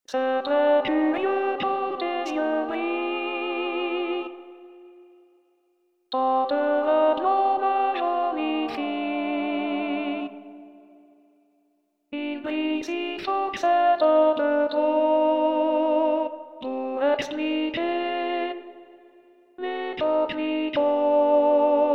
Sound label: Alto, Chorale